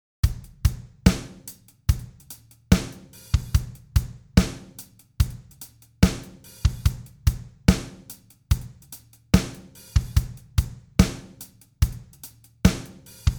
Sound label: beat